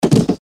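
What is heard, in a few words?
body falls to ground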